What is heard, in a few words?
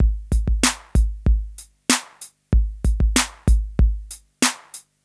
beepers, Drum loops, Electro